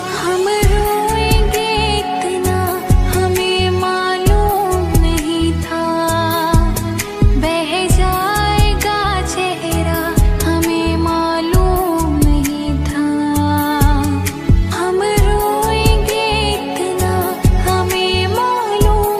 Bollywood Sad Song Ringtone

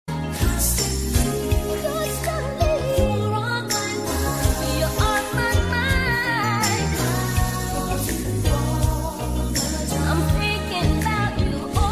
iphone Constantly Ringtone; ringtones

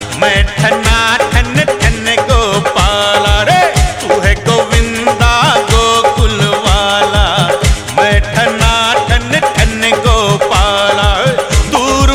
Ringtone

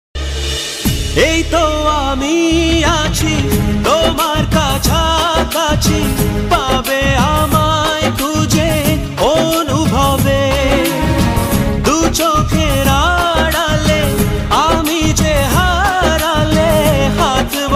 Bangla Sad Ringtone

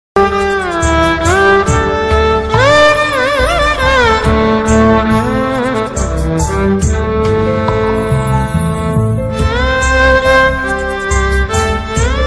Malayalam Violin Ringtone; ringtones

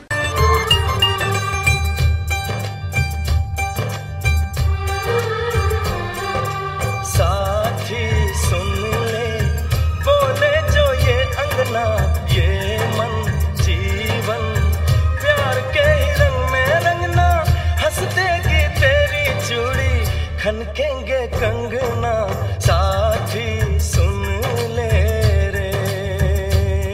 Old Hindi Song Ringtone

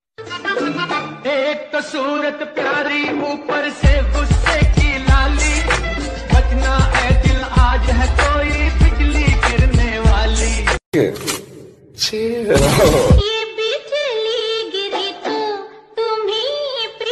Old Hindi Song Ringtone

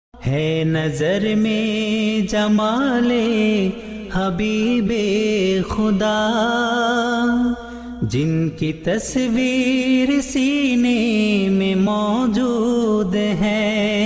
Naat Ringtoen

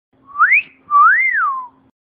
Sexy Whistle Funny SMS Ringtone; ringtones

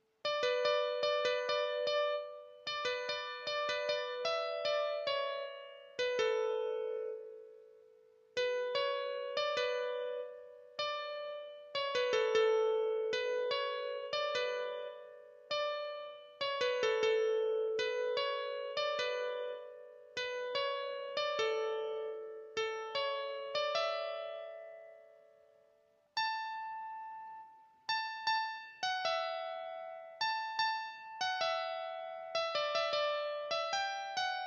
Piano

